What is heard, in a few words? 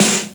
Fairlight IIx CMI SN3